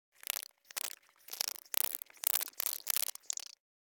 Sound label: Insect Movement Vocal Twitter; Hollywood Edge; sound designer's toolkit v2